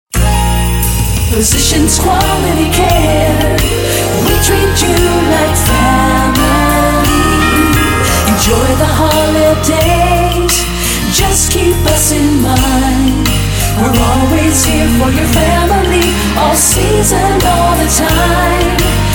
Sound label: holiday jingle